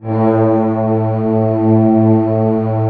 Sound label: orchestra A1 PP; Sound On Sound - Peter Siedleczek's Orchestra